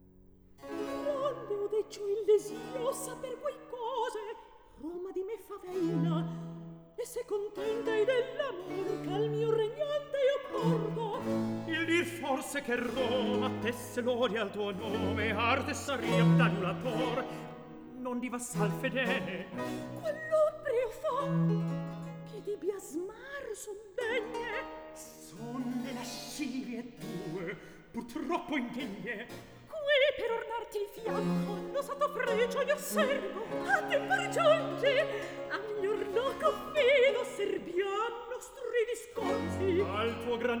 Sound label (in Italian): Recitativo; Atto I Scena 8; Cleonilla, Tullia, Decio; Antonio Vivaldi; Ottone in villa (2010)